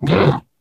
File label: ballbot yes